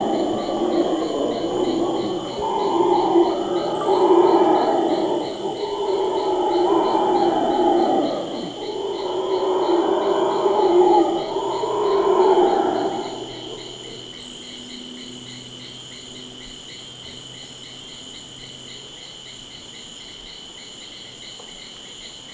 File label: guianan red howler alouatta macconnelli; revuna obezyany